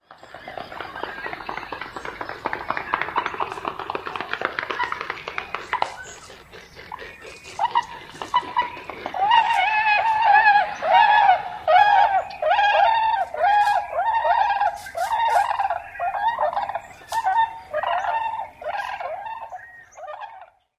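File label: wing-flapping, flight, & calling, Tundra Swan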